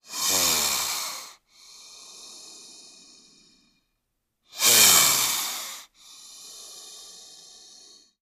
Snake, Bull Breaths, Multiple. Close Perspective.

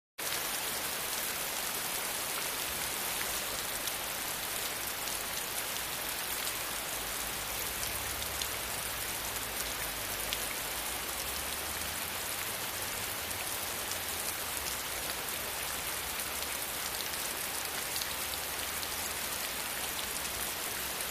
Rain Heavy Fast Splat

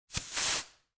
Air Hose Release Air

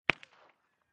Mac10 Machine Gun

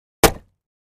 Axe Fire Log, axe hits

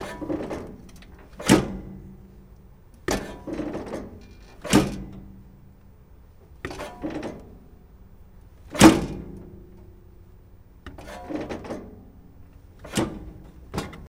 Forklift Brake Pedal Movement

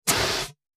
Short Light Fire Exti

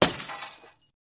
Glass Smash Auto Hea

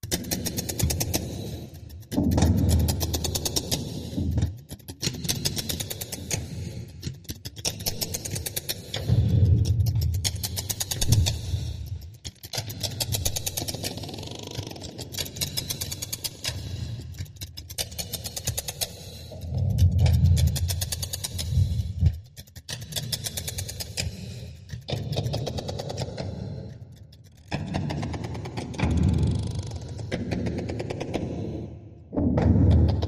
Ratchet Machine Low Re, fx & robots

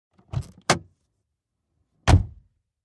Auto Door, accessories doors

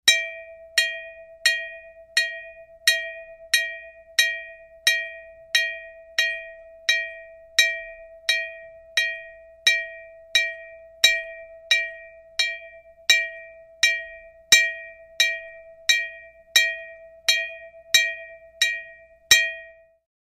Train Crossing Bell

